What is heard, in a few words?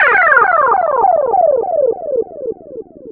Player dead; Megaplane